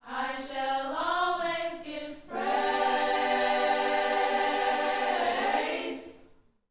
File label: Vocal, Gospel, PHRASE; Spectrasonics